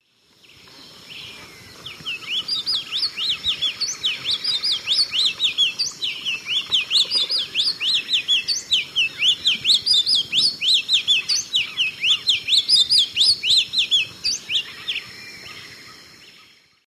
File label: Sporophila murallae, song; S. America; Eastern Lowlands